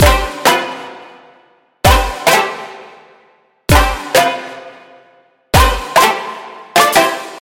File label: Spacey Trap